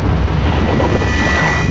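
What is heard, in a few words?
torpedo; batlords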